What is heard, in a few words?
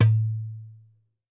Doumbek - A; percussion; Cymatics - Infinity Beta Pack 2.0; Zeus Percussion Collection